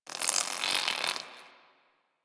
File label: Rock Critter, Ambience; One Offs; Creatures; Warframe; Quests; The New War; Drifter Camp